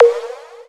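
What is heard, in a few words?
button dry ringing high close digital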